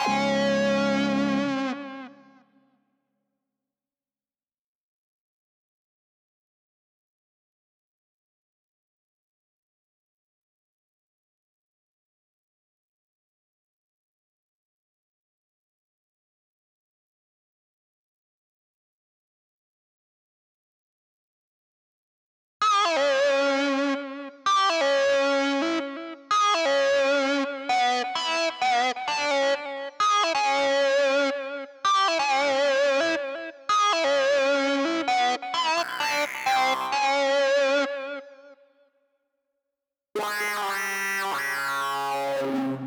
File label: Doom Mix Stem Layer; Asteroids